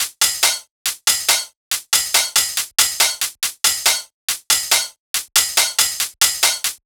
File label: Cymbals 140BPM, dubstep; Loops; Drums; GhostHack; Dubstep Sounds 2025